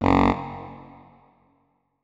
notes, keyboard saxophone; Hideout Furniture

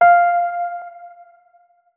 notes, keyboard, electric piano

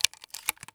mp133 insert; Boomsticks and Sharpsticks; eft wp

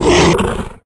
pseudodog threaten, monsters; pdog; Soundscape Overhaul

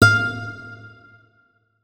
notes, guitar; Hideout Furniture

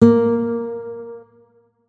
notes, guitar; Hideout Furniture

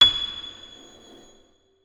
notes, keyboard piano; Hideout Furniture

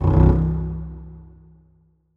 notes, keyboard; Hideout Furniture

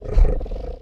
lurk, Angry Chimera Growls; TBs Angry Chimera Growls

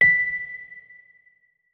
notes, keyboard, electric piano

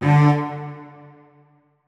notes, keyboard; Hideout Furniture

